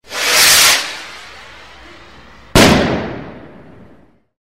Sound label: fireworks